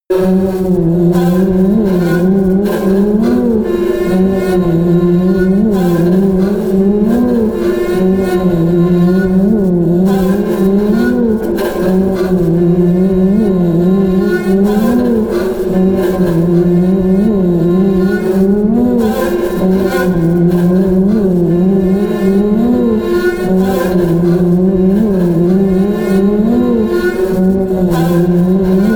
drone erratic didgeridoo high

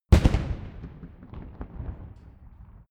rifle salute fire

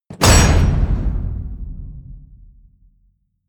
wham, whooshes

